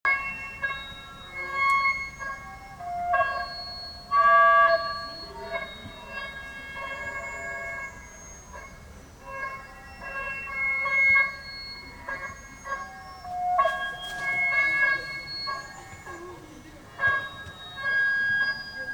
mosque error

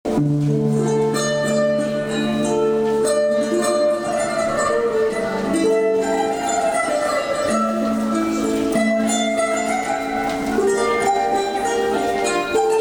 metro santur soundscape; 2019 10 osmanbey

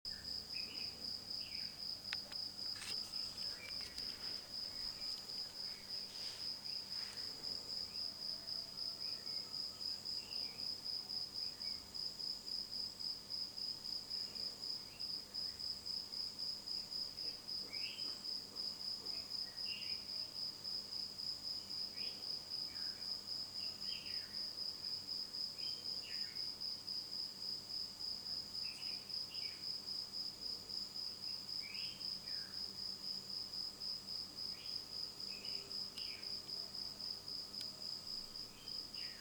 anamur dawn garden ambience

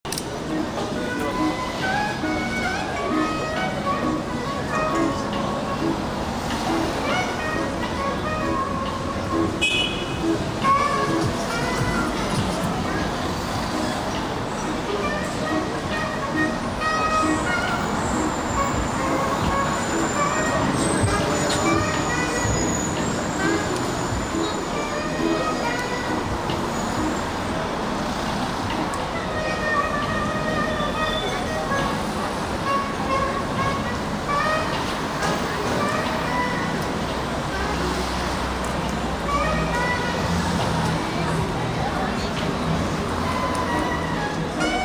istanbul metro flute minstrel

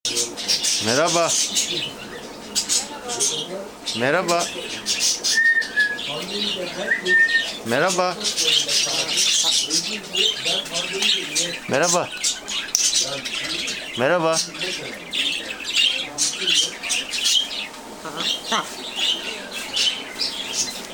pet store ambience and parrot

